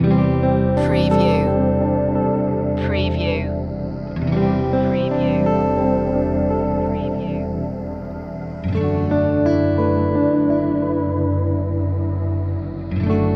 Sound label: tender ambient